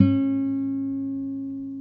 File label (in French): son fort